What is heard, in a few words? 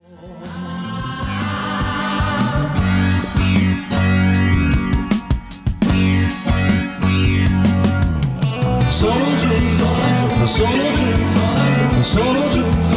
drums